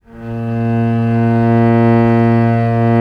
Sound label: A#1, VIOLE, Historical Instruments; USB Soundscan vol.23